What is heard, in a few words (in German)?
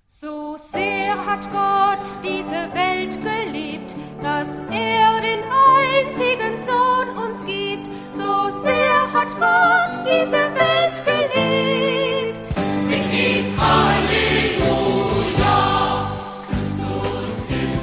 Jugendchor